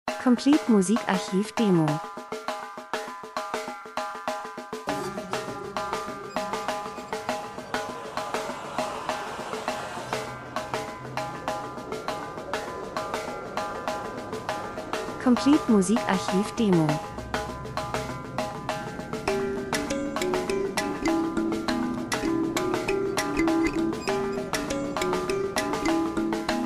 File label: drums; Traditioneller Rhythmus Menschen Zeitgeschehen Maschine Industrie Dokumentation